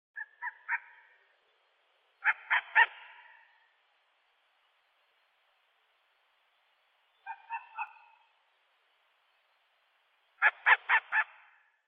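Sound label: long-eared owl voice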